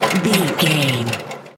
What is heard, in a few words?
metal rattle spin small